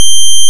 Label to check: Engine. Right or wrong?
Right